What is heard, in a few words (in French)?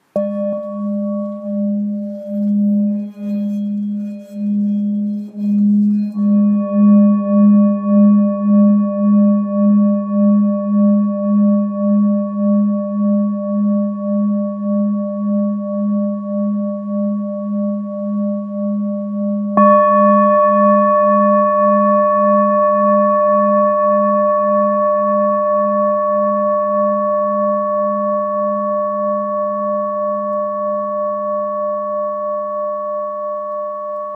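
Bol bengale sol dièse; 900-1kg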